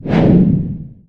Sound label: swing; operation